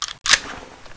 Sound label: mag ins, weapons m9 akimbo